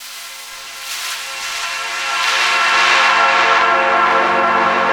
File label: pad, Drum & Bass, 174 BPM; Ueberschall; Drum & Bass Flash Trackz